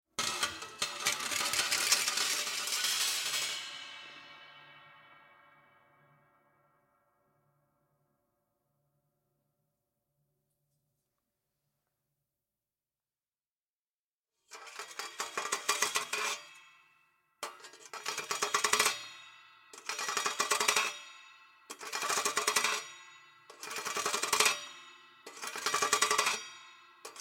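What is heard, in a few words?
Metal Carbon Steel Cheesegrater Scraping Reverb Shotgun Mic; Guild Wars 2 (Original Foley Soundtrack)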